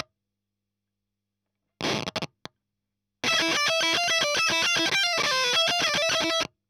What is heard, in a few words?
EL Fuzz End